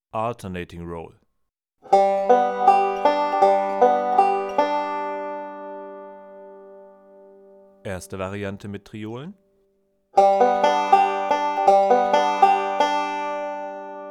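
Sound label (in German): triole plus alternating thumb roll, banjo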